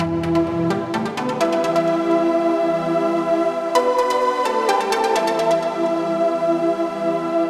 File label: Asia Minor Stabs E 128